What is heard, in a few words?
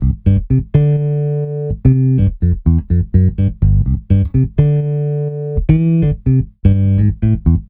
Funky Bass; disco house